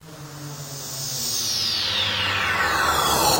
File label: Uplifter